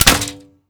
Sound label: crossbow fire